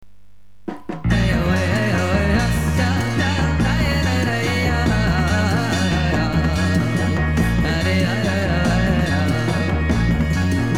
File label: Indojazz